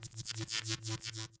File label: robot charging; factorio 2.0.55